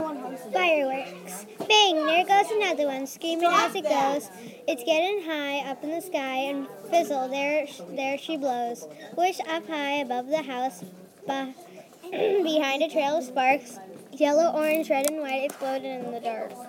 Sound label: Fireworks